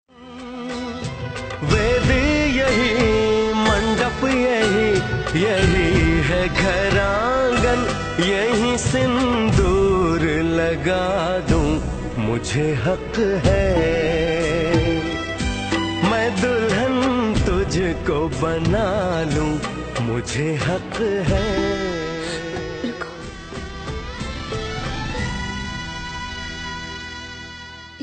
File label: Hindi Love Song Ringtone